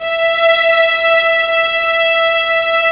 STR VAS, Amb f; Roland L-CD702; Vlas Sect3-4

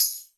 DRY P TAMB, drum; Crypton; DrumBase5000

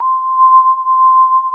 PAUL LEAD, ARP, Analog Fantasies; USB Soundscan vol.49; AKAI

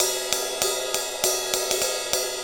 Ride Cymbal Pattern

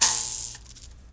MOUTH BOW HI, Heart of Africa

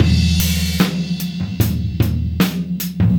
75 live sexy triphop drums

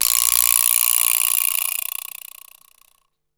Roots Reggae; Sounds Good

